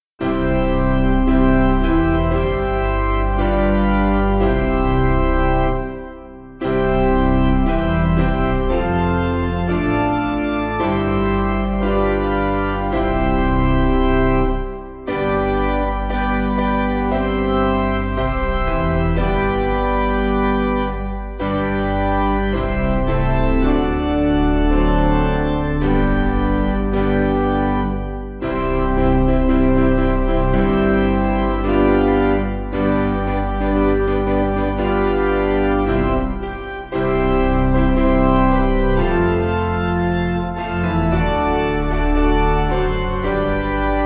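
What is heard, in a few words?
Piano, Music Backing; Behold Jesus The Lord; Malan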